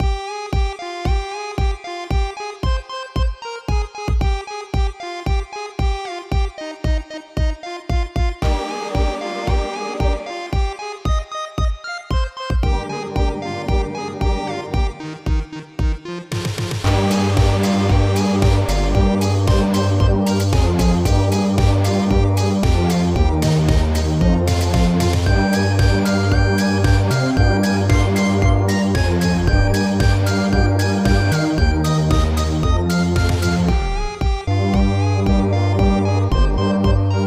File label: Phonk